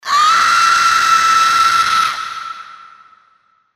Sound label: SFX HALLOWEEN FREAKY FEMALE SCREAM